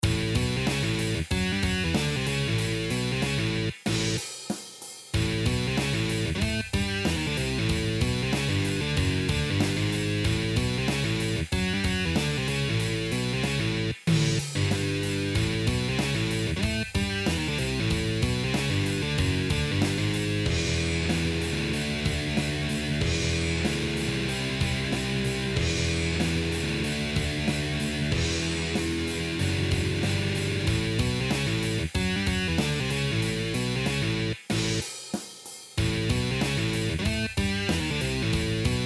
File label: Shuffle; gitarove materialy